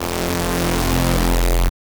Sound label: a charging sound, eerie